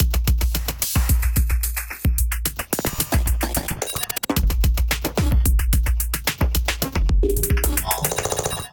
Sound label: Night Hub Kit groove 110 bpm; MIDI Clips; 89 - 120 bpm; Ableton; Glitch and Wash